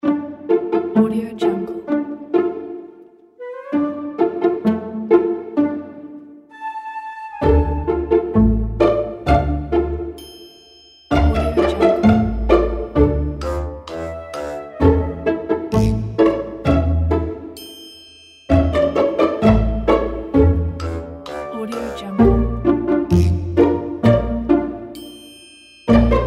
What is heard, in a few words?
sneaky comedy